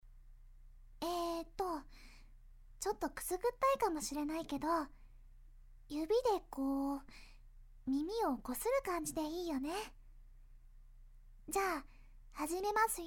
マッサージパート

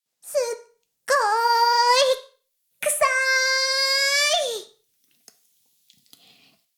フェラ音声; 古き城のドラゴニート2

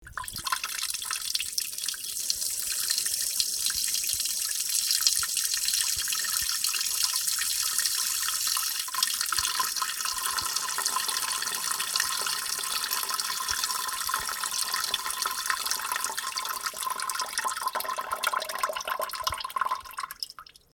じょぼじょぼ おしっこ がまんしてた後

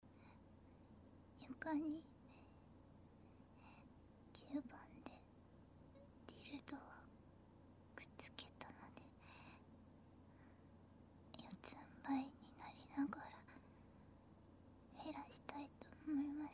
乳首に鈴付けた奴隷ちゃんのディルドフェラ

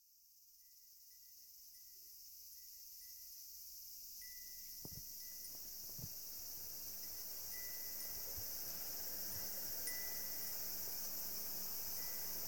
だらだら～。一緒にスイカを食べよ！ASMR

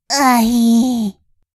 あひぃ～

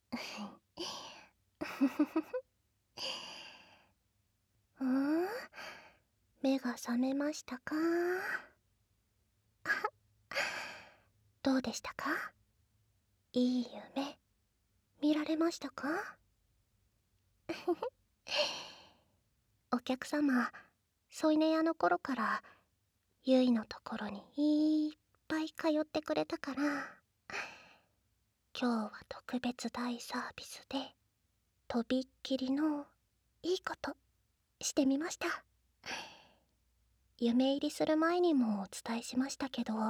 フェラ; おみやげフェラ

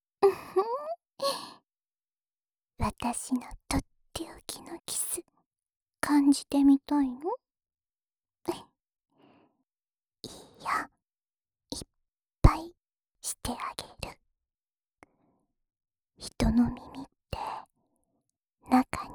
深い右の耳舐め, ASMR; エッチな耳舐め、感じたいの?

